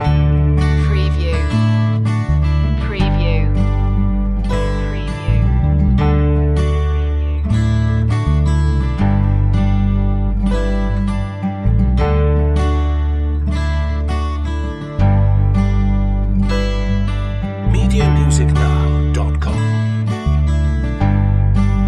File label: back home for good loop